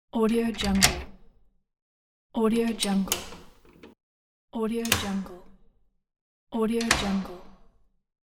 latch

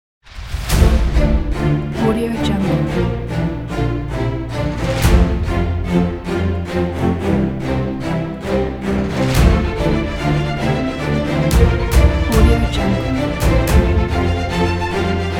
an epic

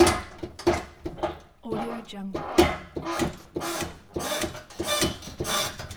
industrial hand pallet truck lever

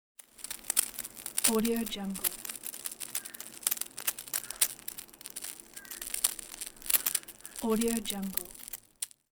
smolder fuse burning outside

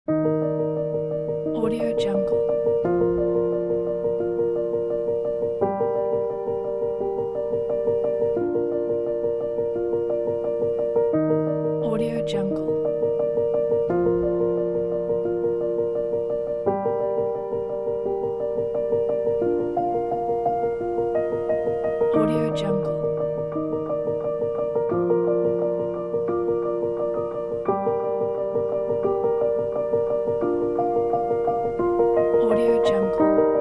a background piano

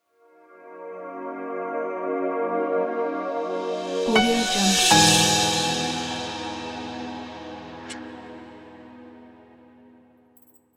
dreamy piano logo

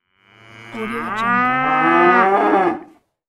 cows moo

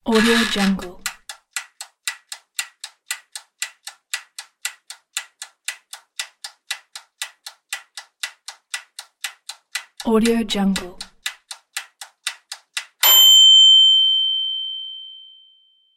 oven timer

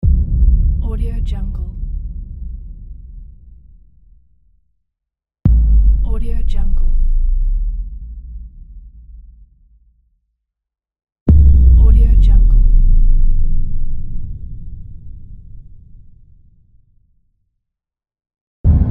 boom; n-audio

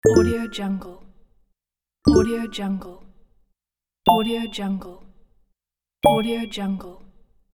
pick coin sounds pack

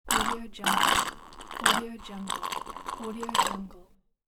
drinking with straw

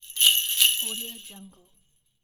sleigh bells short

